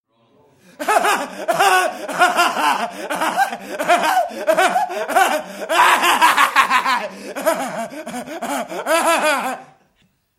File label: craziest laugh